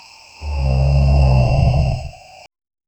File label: soft snore yawn zzz loop, sleeping